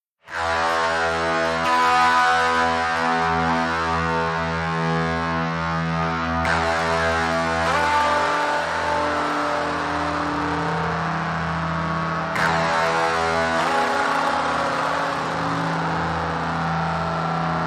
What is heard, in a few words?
ROCK Guitar